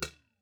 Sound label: Plastic Skull